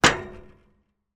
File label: metal impact gate hard vibrate